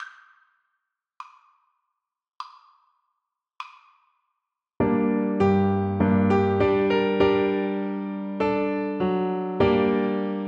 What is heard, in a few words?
bassoon